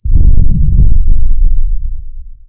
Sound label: cannons sub; guns; tanks; War Thunder